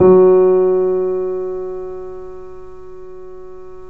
F#, The Piano; InVision Interactive

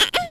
Start Fanfare; Mario Paint

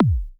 KICK, Hip Hop

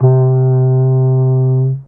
Clarinet C3, Fairlight CMI IIe Reeds

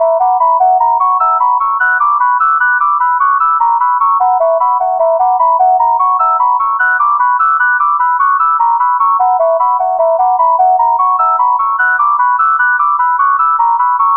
Flutey High Cycle, Synthetic Pulses

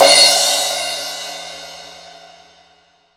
rock crash, Crash Cymbal, mono crash; AKAI S6000 CD-ROM - Volume 3

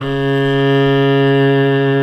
G#1 SOLO CELLO

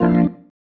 Stab One Shots, Organ

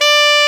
SAX A. MF D, Alto Short; Roland LCDP07 Super Sax

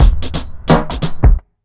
88 BEAT, Pure Electro, BEAT MIX

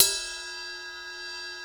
D70 RIDE, D70 DRUMS; Crypton - DrumBase5000

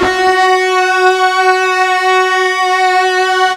FUZZ F#3, Guitar; East West; Steve Stevens Guitar Samples Collection

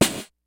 FUNK, DRUMS; Kid Nepro; Elite

